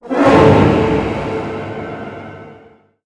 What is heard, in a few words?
Jump